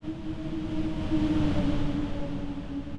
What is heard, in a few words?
filtered synthetized Noise